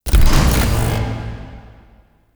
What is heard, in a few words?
UI Mod Activate Generic; Warframe; Railjack; Player Ship